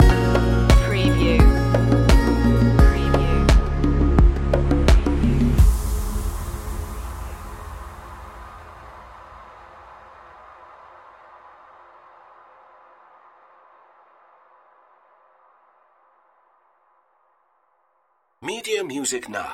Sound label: punch your ticket stinger